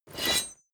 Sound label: blade small pickup